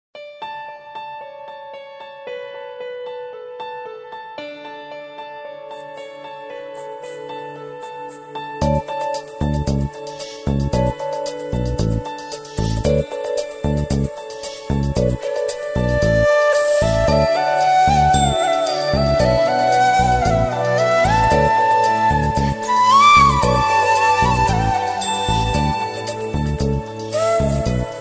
Soulful Flute

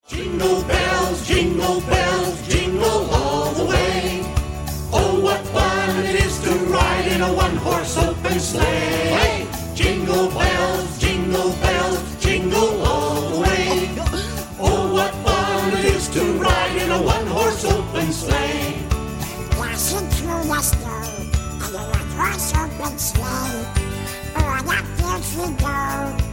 Jingle Bell

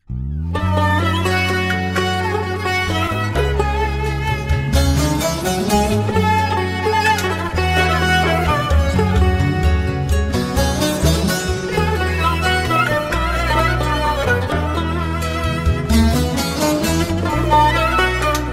Kurdi

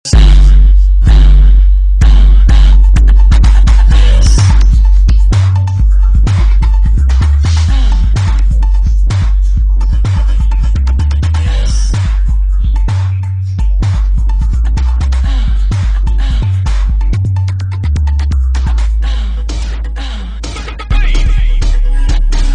Powerful Bass Song

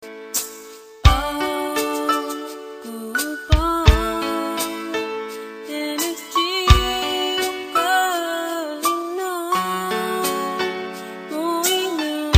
Hmong

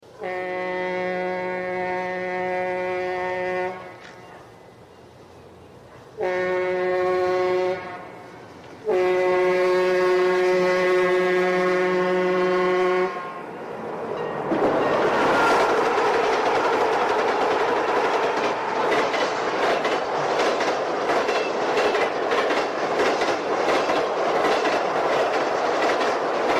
Horn; PRR GG1

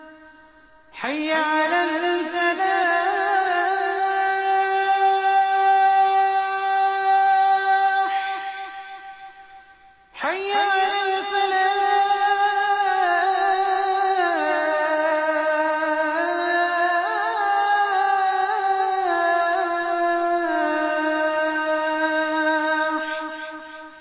Azan Abu Dhabi

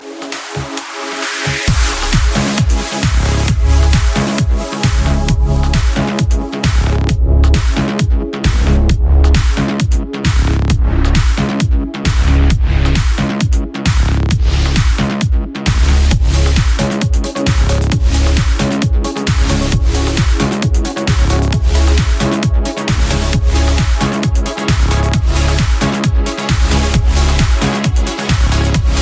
Trance

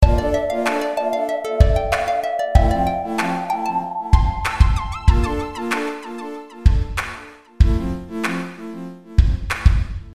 Supreme Beat

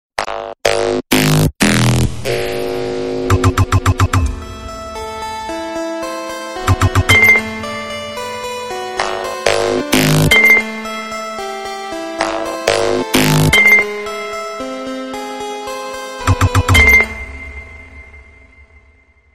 Digital Knock

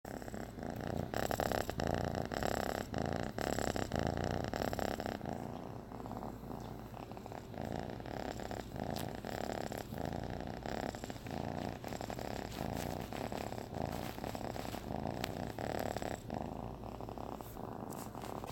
Kitten Purring

